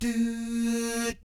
DOO SFZ, Jazz FX

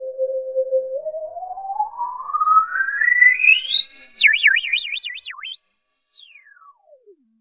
Big Red Sweep; Sonic Foundry (Sony Creative Software); Underground UK House & Electro

